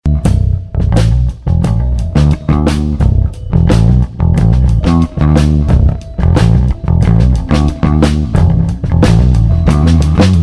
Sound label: Bass Jam